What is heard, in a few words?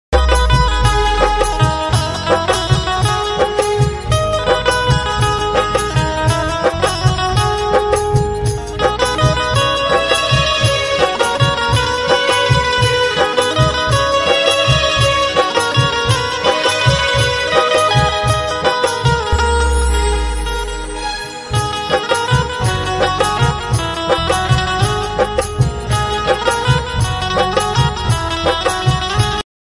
song ringtone instrumental ringtone hindi love